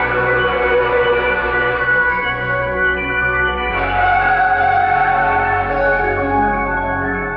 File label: keys, jazz loops